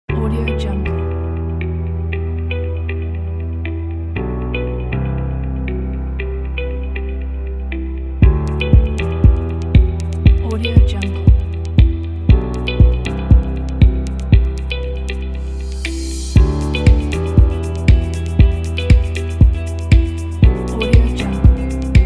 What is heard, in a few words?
melodic ambient pack